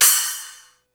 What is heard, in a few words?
SPLASH; E-MU Producer Series Vol. 8 – Platinum Phatt